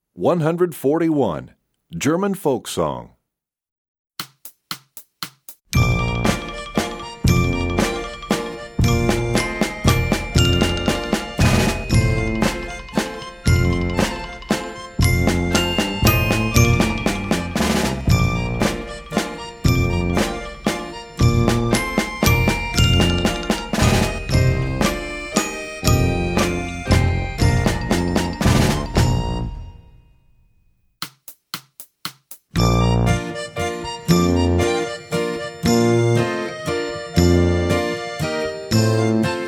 German Folk Song, Percussion; EE2000 Book1

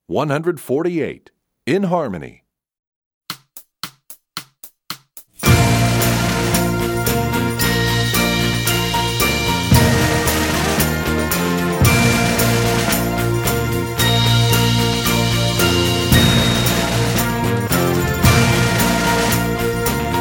Percussion; In Harmony; EE2000 Book1

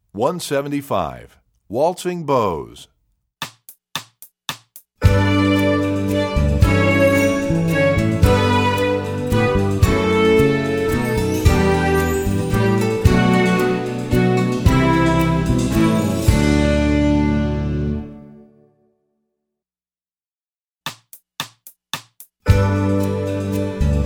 Waltzing Bows, Strings; EE2000 Book1

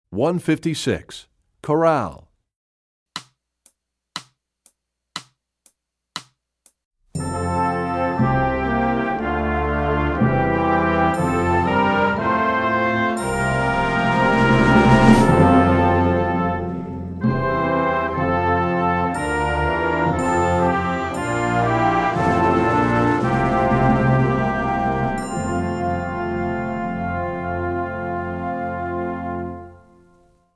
Chorale, Percussion; Rubank Studies; EE2000 Book2

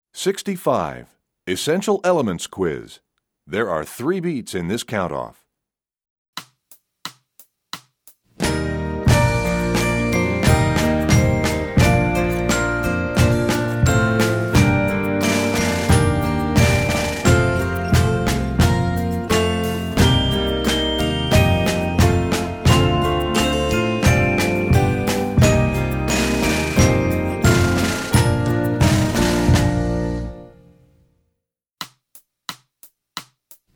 Percussion; EE Quiz; EE2000 Book1

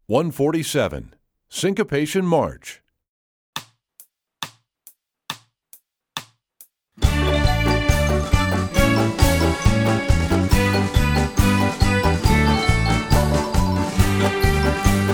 Syncopation March, Strings; EE2000 Book2

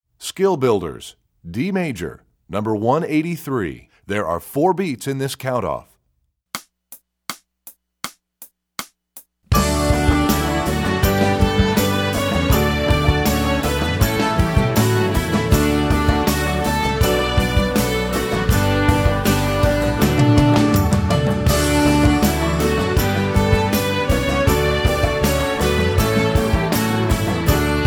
D Major, strings; EE2000 Book1

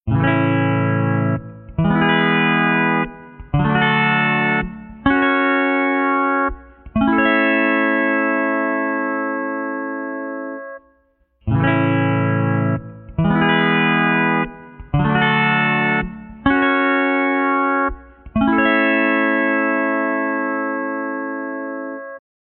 lap steel; Lap Steel Guitar Tunings

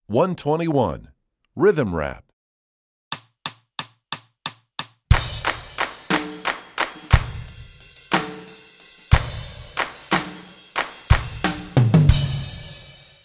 Rhythm Rap, Strings; EE2000 Book2

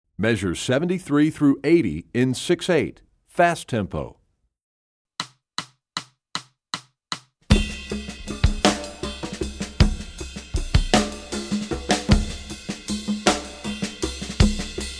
Rhythm Studies, Percussion; EE2000 Book2

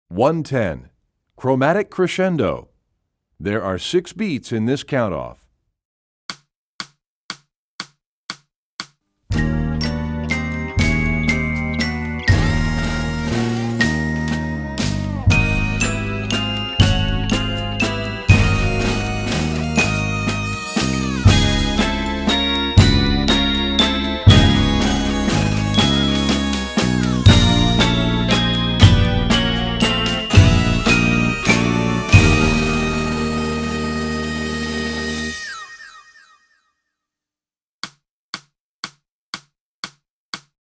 Chromatic Crescendo, Percussion; EE2000 Book2

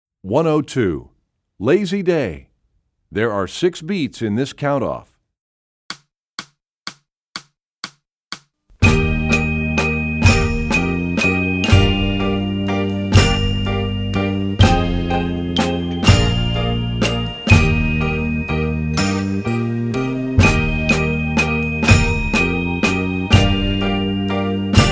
Percussion; EE2000 Book2

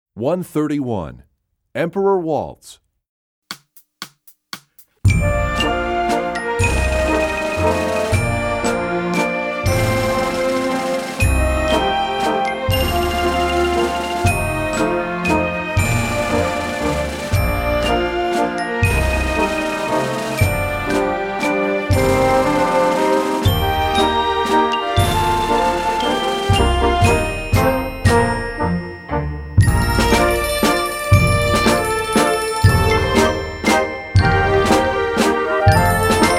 Emperor Waltz, Percussion; EE2000 Book2

